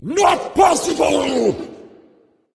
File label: death; smite; voicepack; khepri